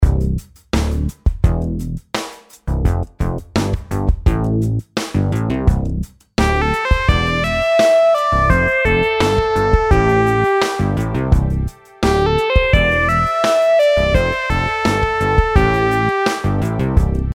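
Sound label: melodies simple 8s triplets C major; melody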